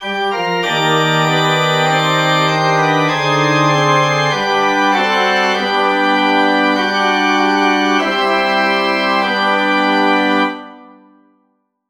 forspil

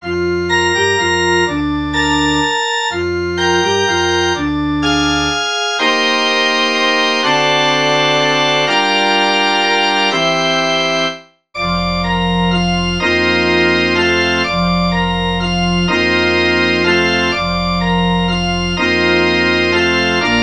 forspil; ddk 151 glade jul

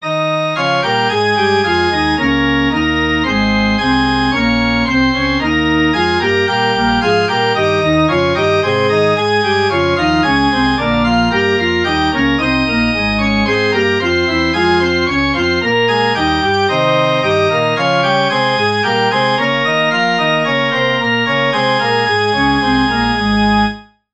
forspil; kender du den livsens kilde

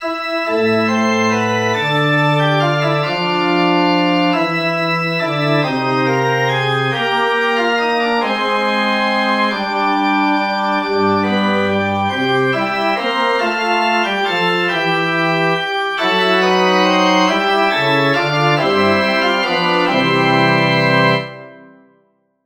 forspil

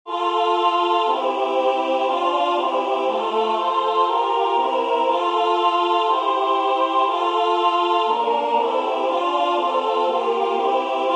3 lige stemmer; dds 462 jesus livets sol og glæde

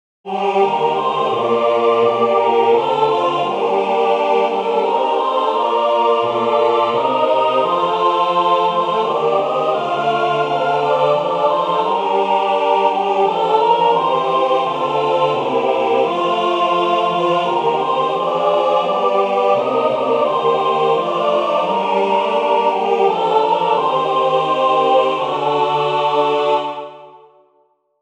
motet

